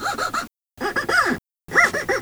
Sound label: All Capoed, handling noise